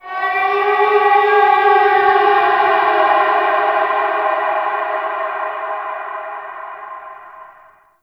Landscape Ambient Texture

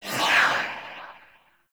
Choir Dash

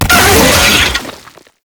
Pain, Vocals; Warframe; Proto Infested; Brachiolyst

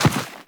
Step Dirt, Footsteps; Warframe; Teshin

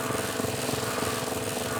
Decoy Loop; Warframe; Loki

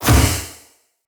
Ghost Flesh Impact; Weapon Impacts

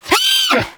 Snow Critter Vocal Pain; Hunting Critters

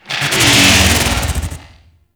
Tau Sentient Vessel Movement; Warframe

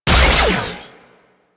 Shot Fire; Disc Moa

